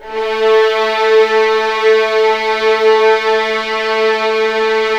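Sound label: A3 violins; East West; The Ultimate String Collection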